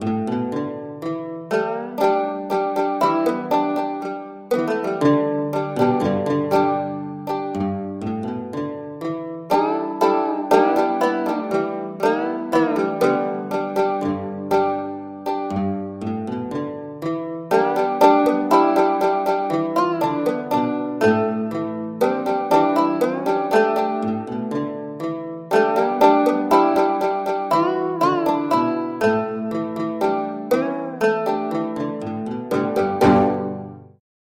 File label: intro virtual banjo and mandolin; syntheway banjodoline; vst